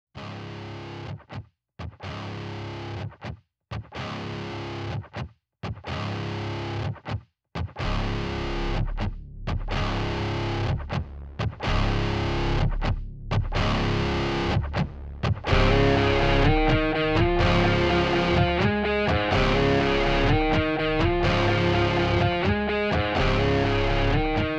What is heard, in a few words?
instrumental